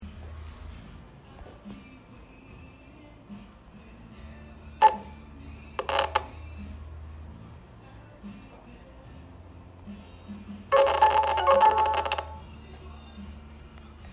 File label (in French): alarme